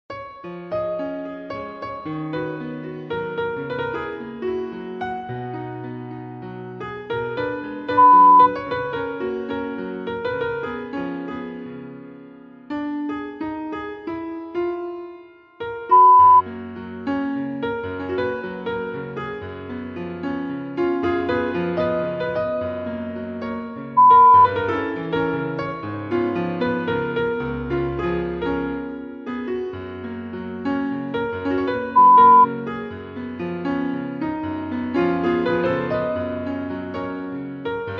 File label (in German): Klassik Klavier